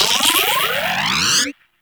Railgun Charge; Warframe; Corpus; Railgun Moa Laser

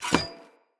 Sphere Polearm Fast Equip, Weapons; Player

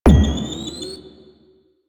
Alt Proj Pre Explosion, Blastor Pistol; Warframe; Weapons; Corpus; Plinx

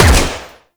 Fire; Warframe; Latron Prime

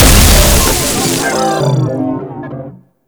Ground Blast; Warframe; ProtoInfested; Ancient; Abilities

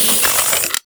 Capsule Return; Ambience; Warframe; Clan Dojo

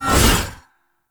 Whip Swipe; melee; weapons; Warframe; Dagath Blade And Whip

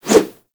Melee Idle Whoosh; Weapons

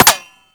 Bullet Impact Metal; Warframe; Weapons; Bullet Impacts

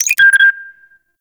Colonist Rescue Move Notification; Warframe

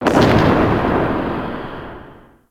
Galleon Eerie Amb; Ambience; Warframe; Grineer Galleon

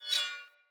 Cleaver Whoosh Accent, Swords; Weapons; Player; Bromius Cleaver

